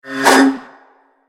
Sword; creatures organic; Lego; Strombie

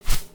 Seagull Wing; creatures organic

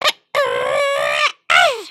robot dance, emotes

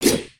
spear, weapons; aronwk collection; ndaudio

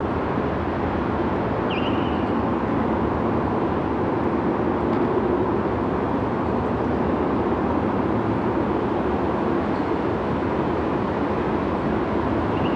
Courtyard, ambience; Ninjago

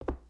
Foot Wood Boot Walk, footsteps

